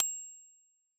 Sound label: bell terminal; stereo